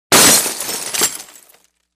glass breaking

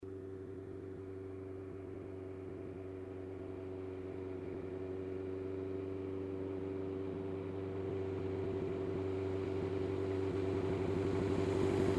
Motorcycle passes by

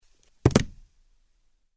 Barefoot jump on the wooden floor; steps

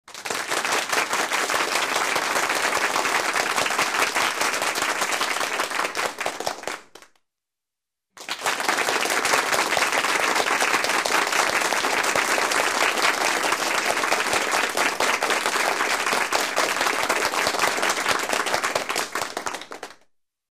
Applause in a small room; standing ovation

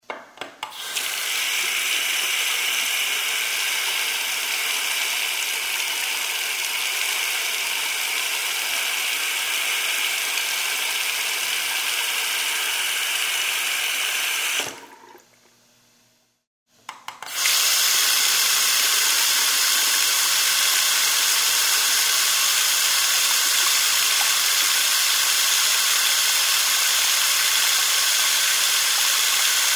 Water flows into a porcelain sink

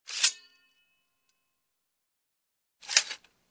The sword is taken out, sheathed; cold weapons

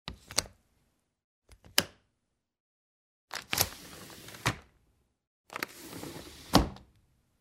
Plastic window open and close; windows

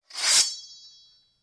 The sword is taken out of its scabbard; cold weapons

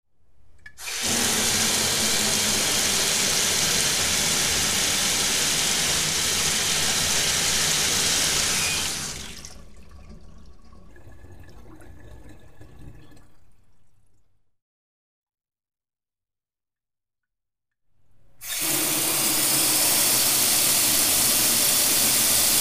Water flows into a metal sink

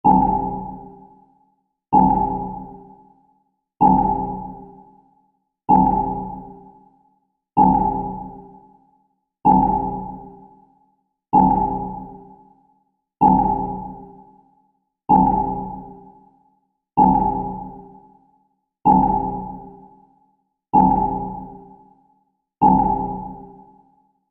Submarine sonar

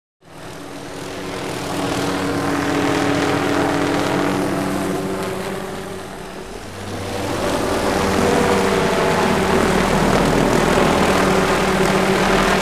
The helicopter circles in place, flies away

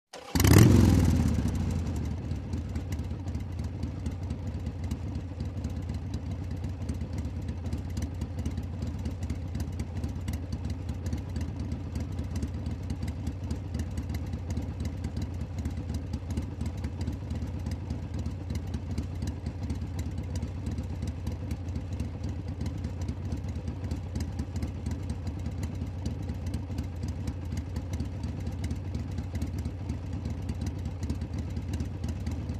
Motorcycle starts, waits, turns off

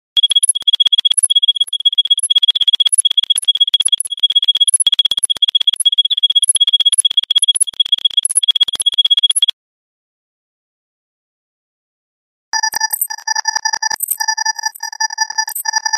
Morse code; radio signals

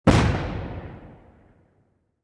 Grenade explosion

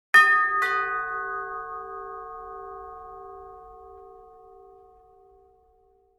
door bell rings bell sound

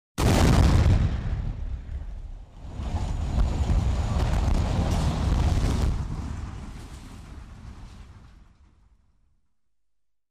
Demolition of the building by demolition; explosions

